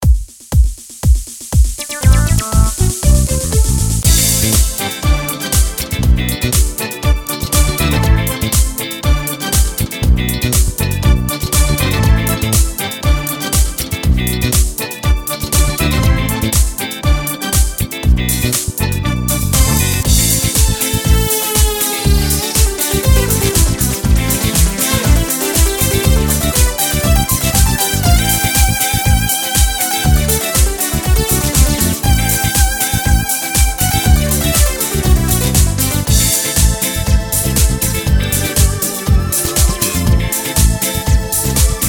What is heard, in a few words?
Dance; AjamSonic Dance Room